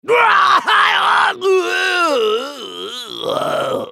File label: dying; Trundle